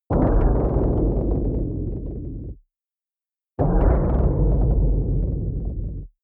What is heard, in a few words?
protective shield hit absorption rattle short; energie